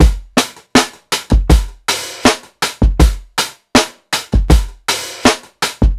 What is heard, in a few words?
PICCOLO 80 16TH BEAT, DRUMS LOOPS; BASEMENT DRUMS VOL 2